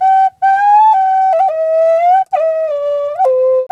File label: didgeridoo riffs